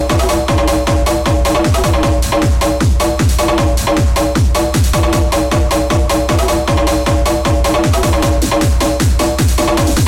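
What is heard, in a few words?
rocking in hardbass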